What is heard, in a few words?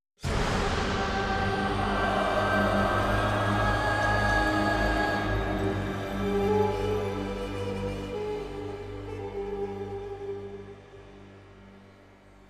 Boss Fight Death; Troy Musou; PS3